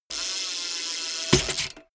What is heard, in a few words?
bmx bike crank freewheel brake